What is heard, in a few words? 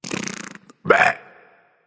tongue, voice; Smite; ymir skin14